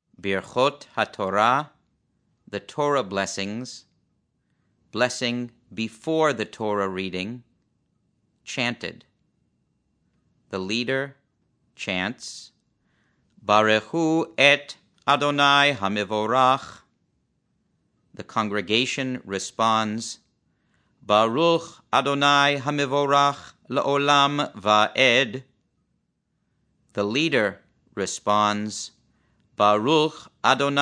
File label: torah chanted